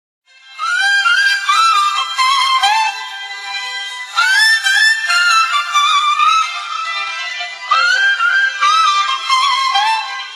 trumpets; daima

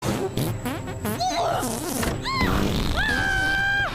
farting; total drama

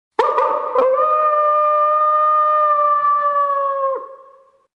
coyote howl

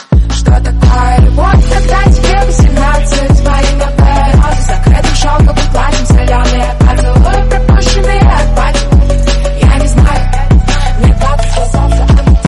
phonk

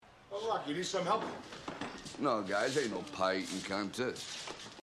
pie eating contest; rocky

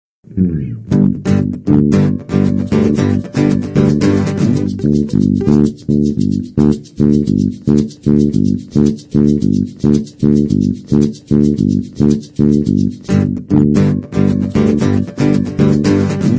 instrumental

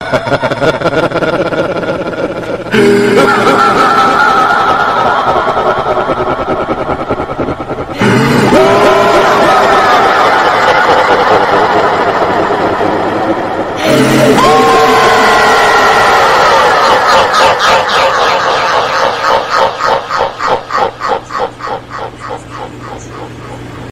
evil repeating laugh

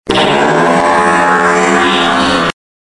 long fart

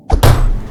tap; geoguessr

